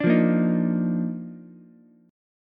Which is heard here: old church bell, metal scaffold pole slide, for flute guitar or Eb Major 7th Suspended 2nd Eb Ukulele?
Eb Major 7th Suspended 2nd Eb Ukulele